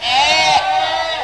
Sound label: bullcalf